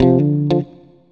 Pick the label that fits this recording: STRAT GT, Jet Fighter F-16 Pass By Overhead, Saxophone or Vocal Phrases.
STRAT GT